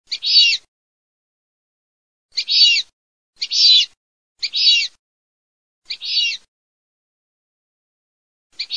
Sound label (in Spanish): SIRIRI COLORADO